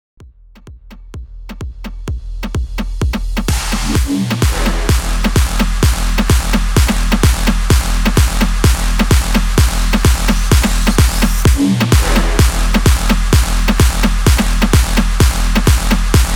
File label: BIG ROOM 128 BPM